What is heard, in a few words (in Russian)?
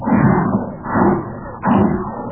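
монстр далекий приглушенный монотонный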